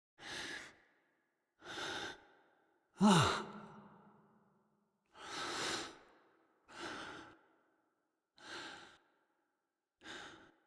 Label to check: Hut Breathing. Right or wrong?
Right